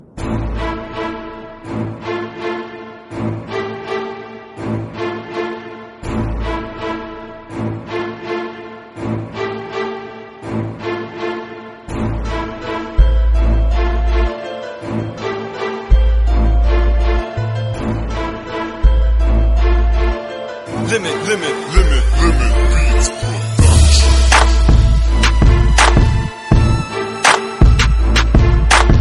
orchestra

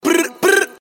Brrrup Brrrup

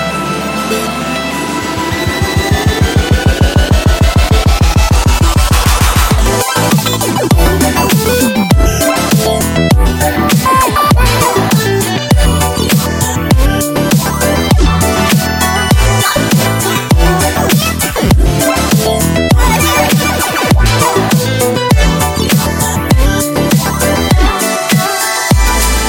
anime music

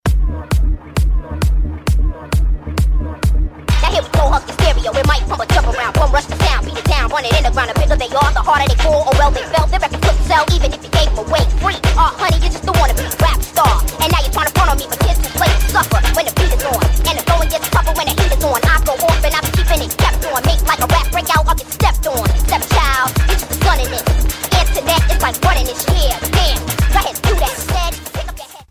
Ringtone

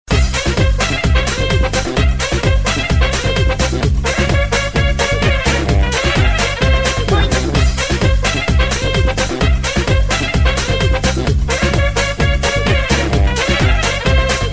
techno trumpet

